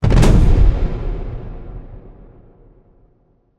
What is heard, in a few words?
Drum Roll